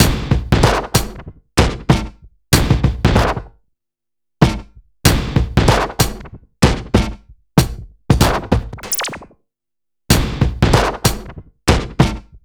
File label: HIPHOP, Funky Xtreams; INIS